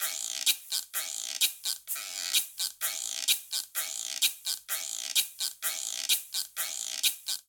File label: Cha-cha-cha Shaker Guiro-128bpm; Latin Percussion; Ableton; Factory Packs